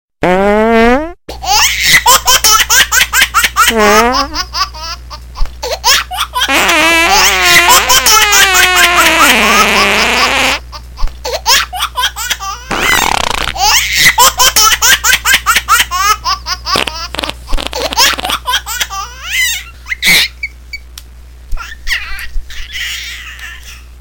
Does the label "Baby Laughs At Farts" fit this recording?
Yes